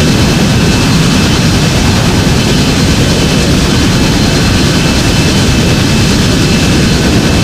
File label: engine tvd 1250rpm; War Thunder